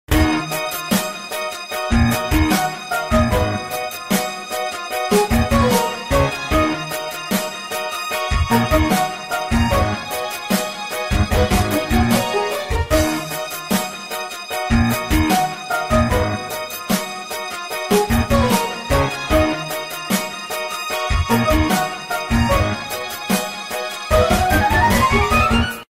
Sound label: Groove Motorola